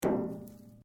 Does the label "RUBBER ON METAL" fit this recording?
Yes